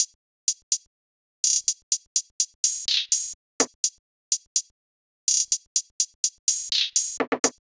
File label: Hihat Loop 125 BPM; Suicide Doors; Cymatics; Fiji Hihat Loops & Percussion